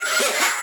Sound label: Vocal Pitched "Hahah"; bass house